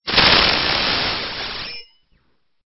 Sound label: Stinger; STINGERS